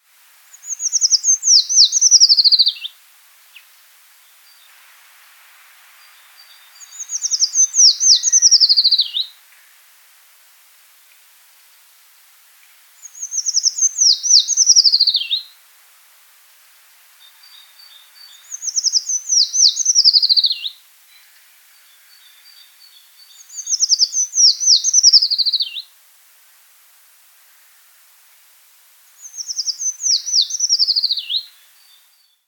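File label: treecreeper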